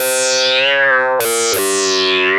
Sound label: Arp E Line, ARP Odyssey; musicradar-synth-samples